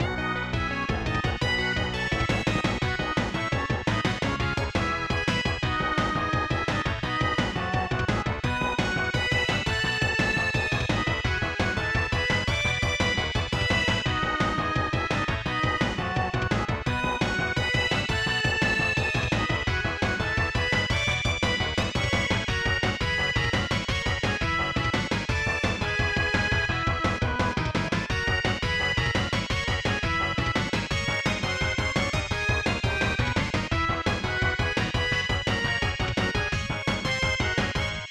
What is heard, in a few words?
Soccer Game; Zenkoku Koukou Soccer Senshuken 2; SNES